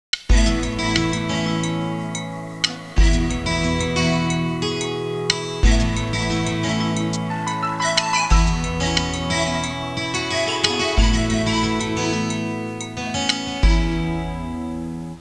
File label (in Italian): suonerie musica hawaiian